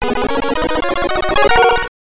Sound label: Victory Jingle; ottifanten kommando stoertebeker; gb